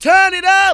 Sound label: VOX; ZERO-G; Datafile